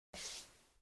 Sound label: wall